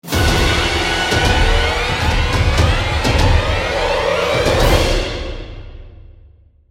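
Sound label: Music Stinger Snow Large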